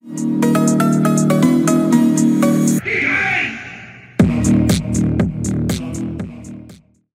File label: phonk